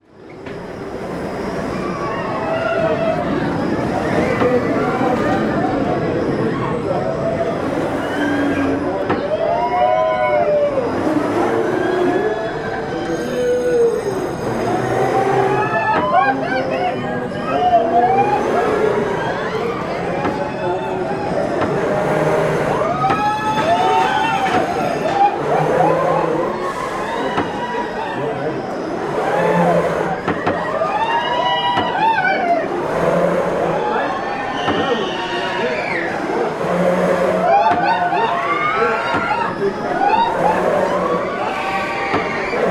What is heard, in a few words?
Roller Coaster Voices Cheers; 2496SoundEffects; Coastal Ambience and Beaches